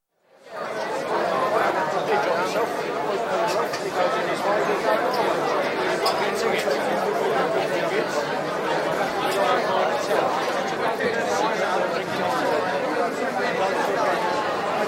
National Hunt Racing, refreshment hut, very busy with chat; Horse Sound Effects; BBC